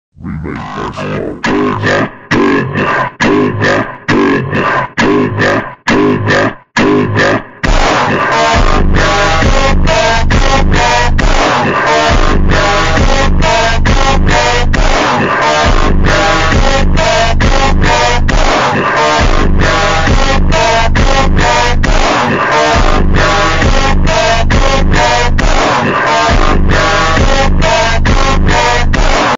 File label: ultra slowed reverb automotivo phonk